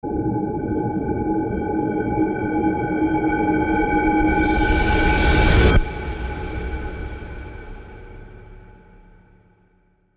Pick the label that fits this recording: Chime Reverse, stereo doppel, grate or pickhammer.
Chime Reverse